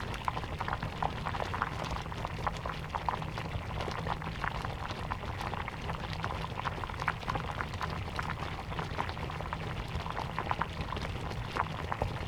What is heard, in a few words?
bubbling pots loop; dust chef